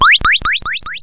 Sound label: 1up; VIDEO GAMES; tacscan